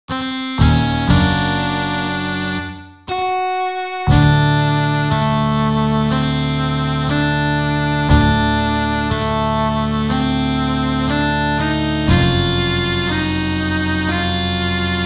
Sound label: Instrumental